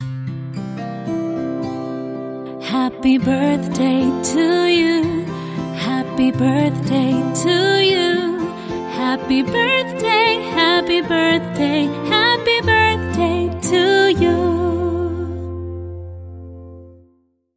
Female Vocal with Acoustic Guitar Happy Birthday

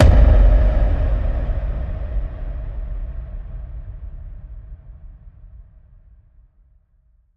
Quantum Space Hit

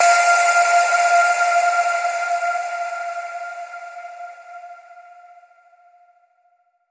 Expanse Distant Ping

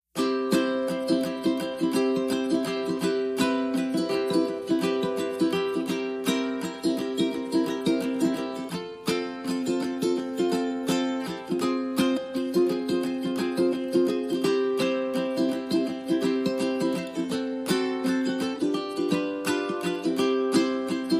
Ukuleles

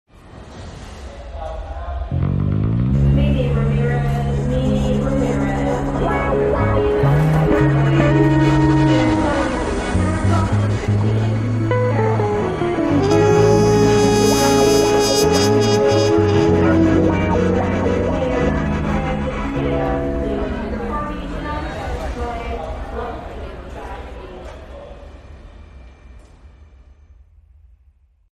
Underscore

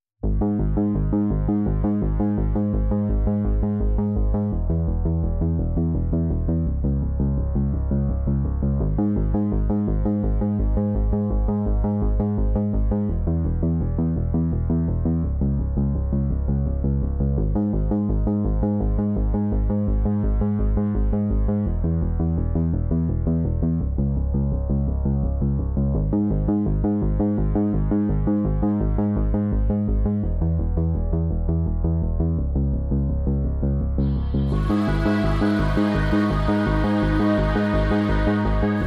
Ambient Synths and Strings Mix

